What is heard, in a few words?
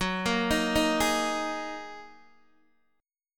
F sharp 6th Add 9th F sharp Guitar DADGAD